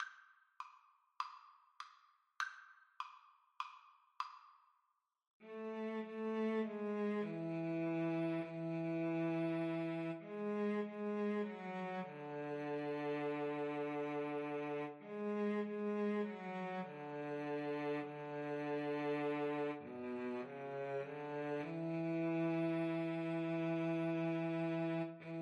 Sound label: Cello Duet